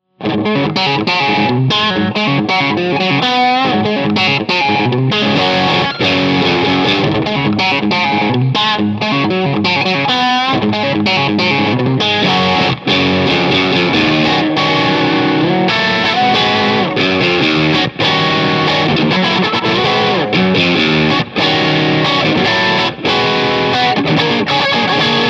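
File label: Vintage Crunch Suhr Modern Tele Rhythm, guitar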